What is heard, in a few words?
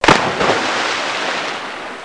bad splash; PUZMANIA